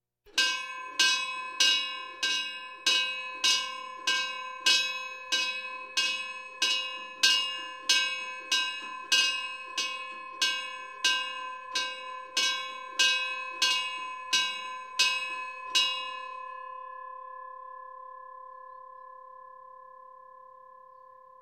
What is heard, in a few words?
Police, Fire engines